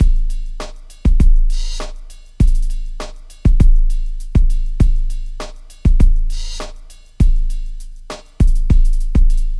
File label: Drums, 100 Cmin